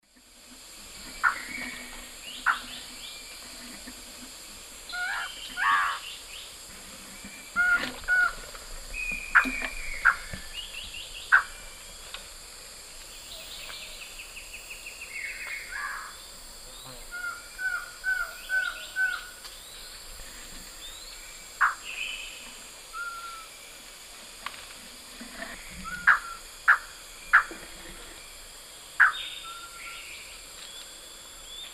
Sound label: Thamnophilus aethiops, song and calls female; S. America; Eastern Lowlands